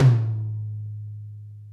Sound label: tom; Sonic Reality; Interactive Drum Kits; 90s sample cds